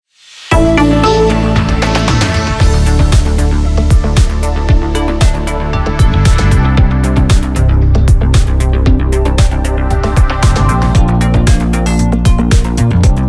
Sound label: Traffic; Hamburg 2